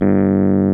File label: Rhodes Clean G1; Mo' Phatt; Digital Sound Factory Mo' Phatt